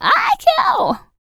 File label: FEM. HUMAN, Vocal, R&B & Dance; ILIO; Vocal Planet VOL-4